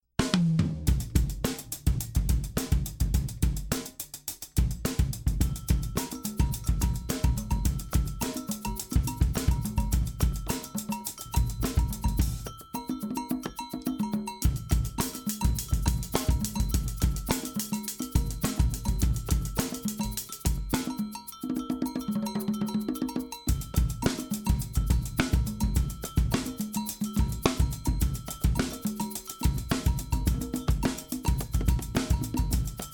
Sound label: beat roots bells